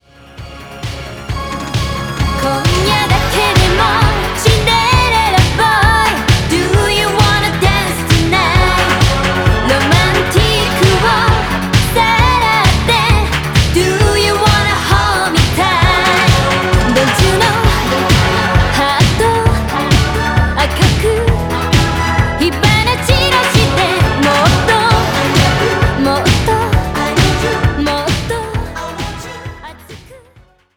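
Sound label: jpop female; stylus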